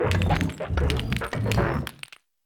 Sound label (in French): Cri